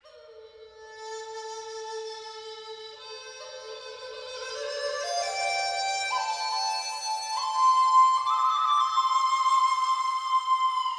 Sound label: Background Music; Platoon